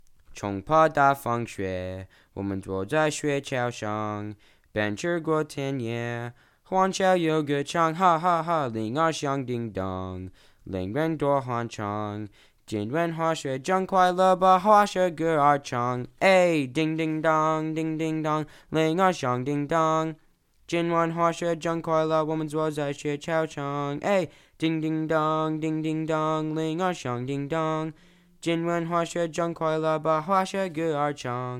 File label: jingle